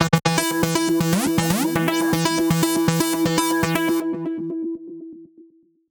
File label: Tech Synth 120 E, techno 120bpm; musicradar; Synths